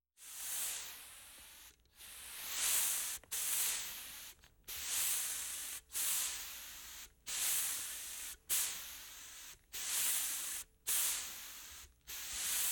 Air Brush Bursts Long; Universal SFX

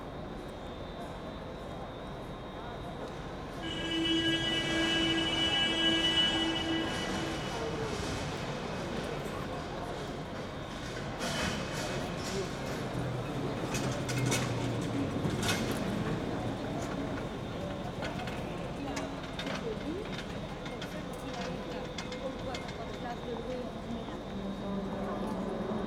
Train, Station, Light, Air Blast; Poetry Project

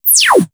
Channel Off; The Look Back SFX

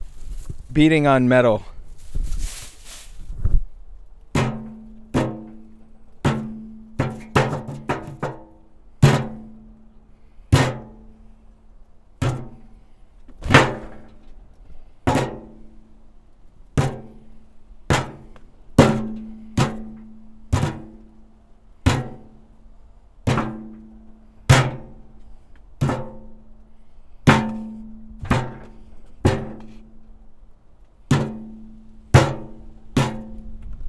Metal Hopper, PUMPKIN HARVEST FOLEY

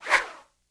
Whoosh, Arrow, Feather, Low; Universal SFX

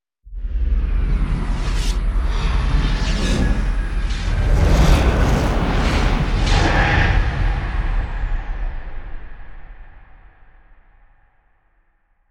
Warble; Universal SFX

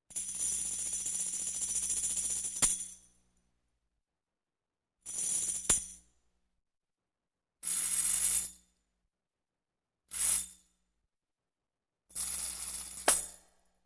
Tambourine Steady Shake; Electa Quinney

